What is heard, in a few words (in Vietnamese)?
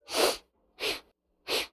tieng ngui mui huong hoa hong